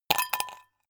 ice cubes glass; gastronomie; berufe

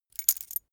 key ring mid size put down; objekte; schluessel

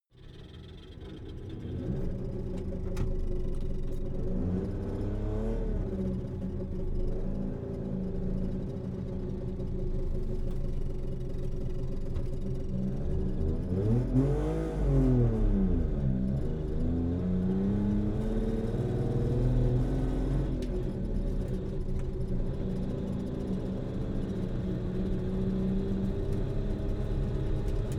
car saab 92 drive slow interior

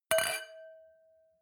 wine glass similar clink bounce; berufe; gastronomie; soundpool

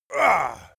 male attack manly; schreie; menschen

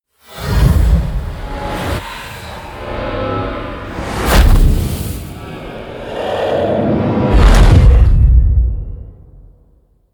magic spell evil dark, horror

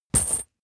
metal chain impact

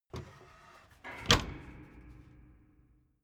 door flap loft close

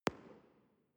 explosion; hits-explosionen

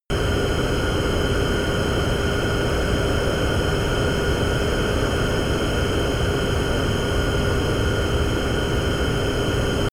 plane bombardier private turbine idle direct; flugzeuge verkehrsflugzeuge; verkehr transport

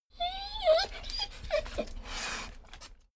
dogo argentino whine snuff; natur-tiere; hunde

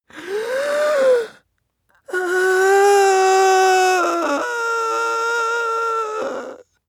male inhale exhale whine hard; soundpool

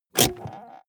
car bmw e46 interior handbrake pull up fast creak

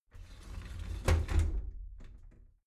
shower slide door inside close soft halfway

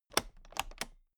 toolbox latch close loose heavy, handwerk; industrie wirtschaft

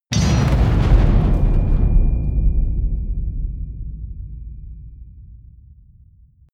hit metal

